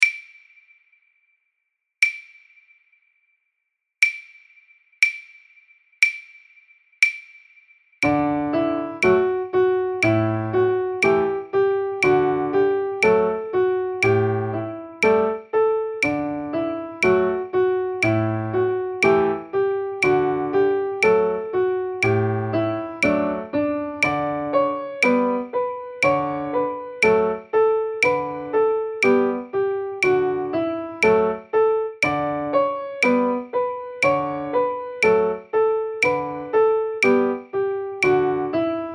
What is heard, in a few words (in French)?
Noire 60 avec metro; suzuki V1